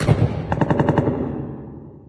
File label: inside battle, prison; ambient; source engine; hl2; video games